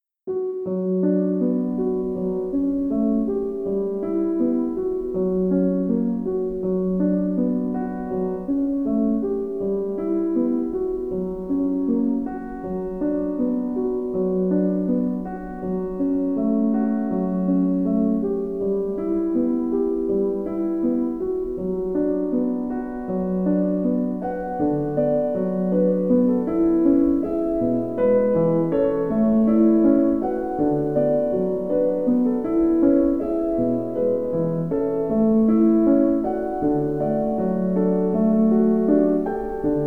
New Age Piano Music

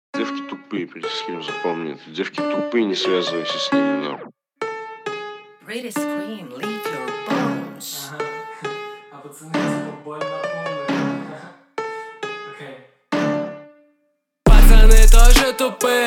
31 33 35Hz BASS Low Bass

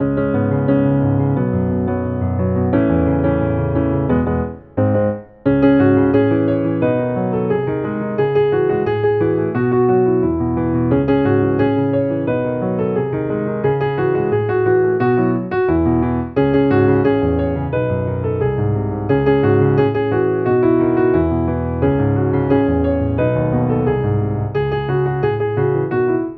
Piano

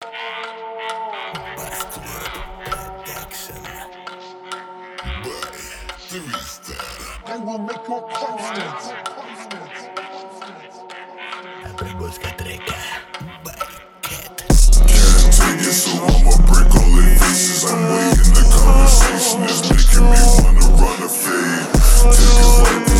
33-37 Hz Bass

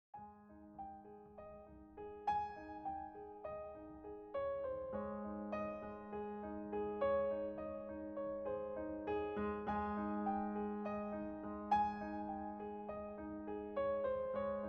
Piano

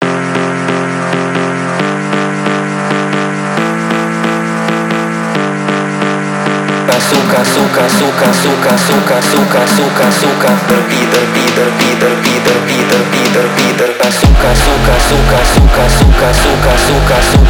Brazilian-Russian Phonk

